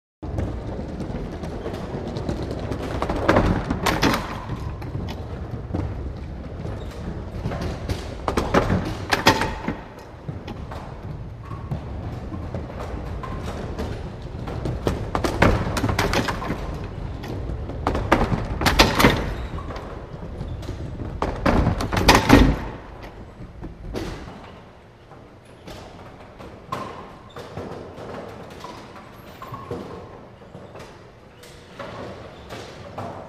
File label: Bowling Pin Setting